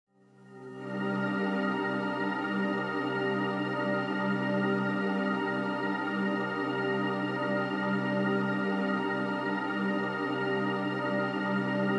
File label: ambient